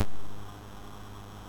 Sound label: sid MOS6581R2, GAMES; Face Off